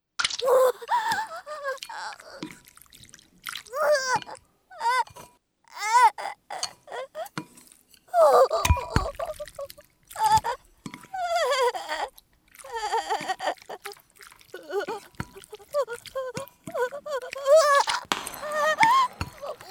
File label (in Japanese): 眼球の周りをスプーンでこねる; 効果音あり